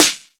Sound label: Trebly Acoustic Snare D Key